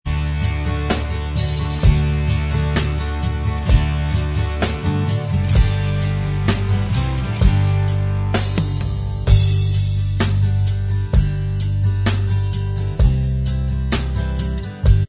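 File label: Inst Track, instrumental track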